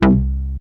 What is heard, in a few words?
Arp B C2, ARP Odyssey; synth; musicradar-synth-samples